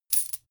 coins handling many

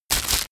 plastic package grab

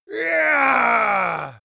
male victory deep

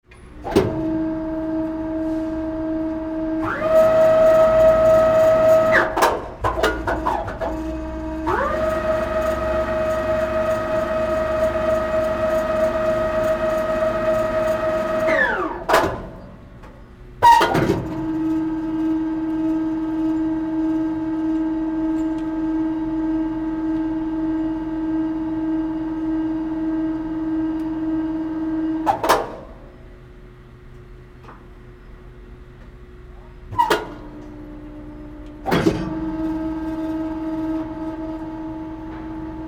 building crane mix

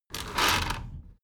window roller shutter open soft single pull

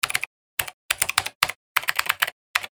computer keyboard input

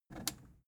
plastic button scratch push enable

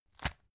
tissue package put down

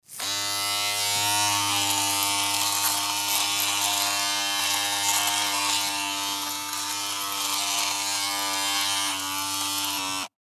bathroom shaver

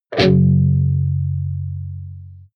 electric guitar distorted powerchord low muted

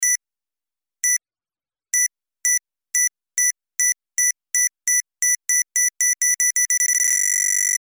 beep speed up

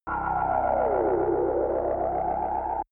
alarm down the hallway

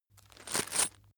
chain mail movement

